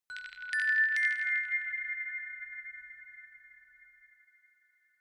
notification glockenspiel rattle triad up diminished